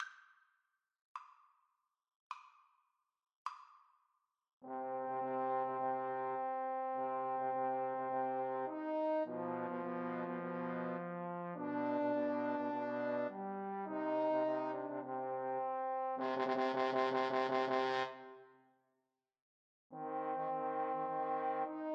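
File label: summer 2nd movement four seasons, Trombone Trio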